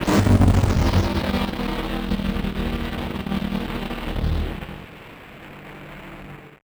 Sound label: Malfo Syn, malfunctioning synth